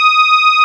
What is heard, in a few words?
PD DARK, Nord, LEAD PAD HRN; Wizoo - Powered Nord